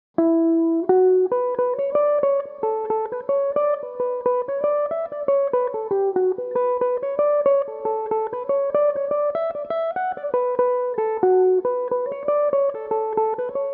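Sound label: B minor, irish mandolin, tunes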